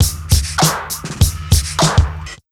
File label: DRM SN; USB Soundscan vol.03; AKAI